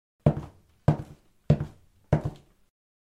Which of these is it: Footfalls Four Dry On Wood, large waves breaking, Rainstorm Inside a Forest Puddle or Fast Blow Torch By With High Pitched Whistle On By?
Footfalls Four Dry On Wood